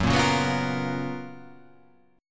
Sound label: F sharp Major 11th